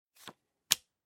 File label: put the card blackjack, casino